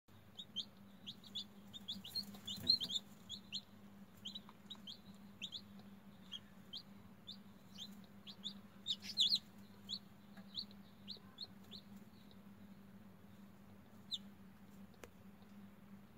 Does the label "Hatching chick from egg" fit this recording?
Yes